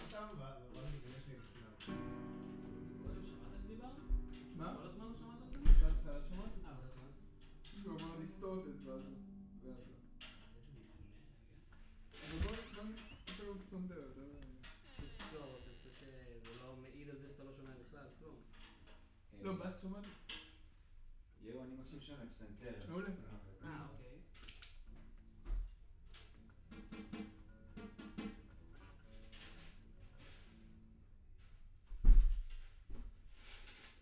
GTR Mic

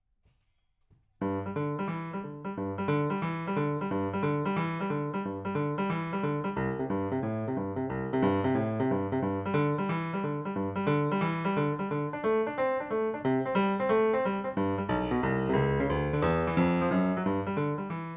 PIANO, RNR for piano

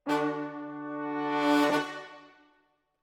Horns Over

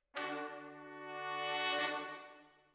Decca, Jazz for piano

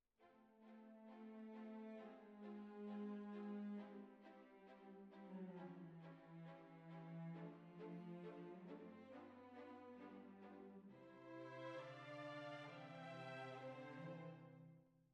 Flute

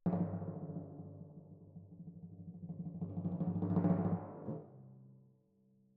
Timp Close

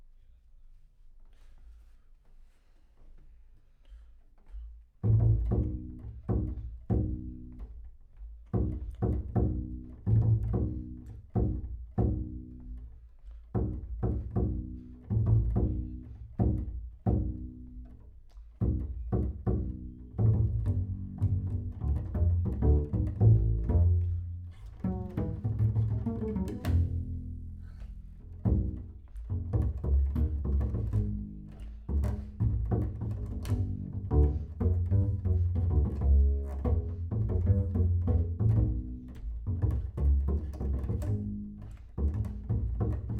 Bass Room

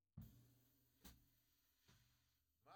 Tom

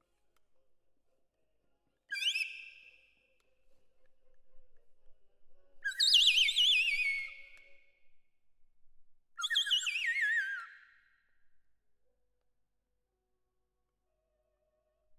Perc Close

